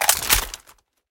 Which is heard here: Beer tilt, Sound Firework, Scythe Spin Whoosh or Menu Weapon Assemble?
Menu Weapon Assemble